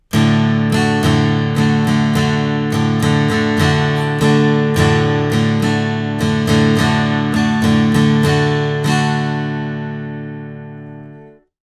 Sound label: Guitar Twin87 vintage